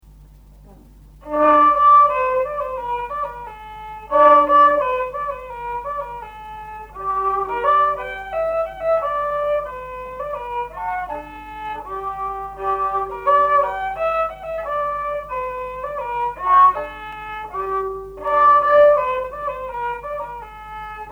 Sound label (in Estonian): Poide viiul folk; Teed ja kohvi keedeti; Erich Kask